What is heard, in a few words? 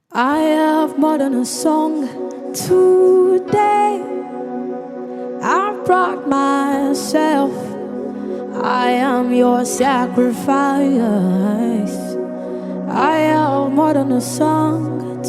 Acoustic cover